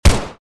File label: lr300 fire; STALZONE